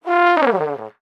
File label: Cartoon Trombone; AdobeCC